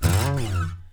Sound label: SLIDE, guitar; Spectrasonics; Hans Zimmer Guitars VOL-1; TAKA FX